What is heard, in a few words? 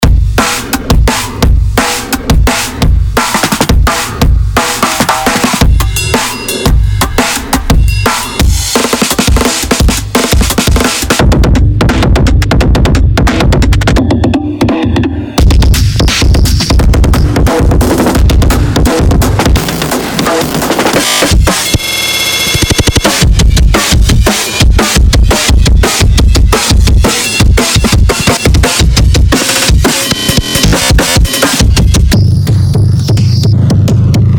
Raw Acoustic D B IDM Series Kit